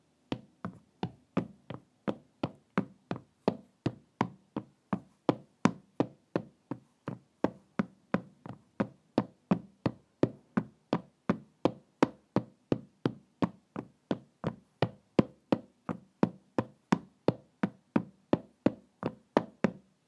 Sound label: Footstep Boots Wood